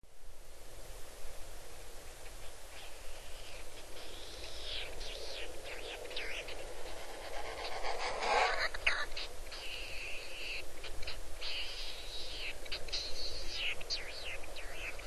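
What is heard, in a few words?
Latham's Snipe, nagano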